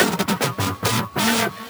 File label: Fill 140 BPM